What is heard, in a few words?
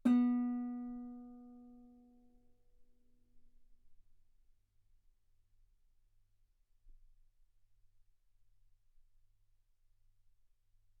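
Guitar ff B string B3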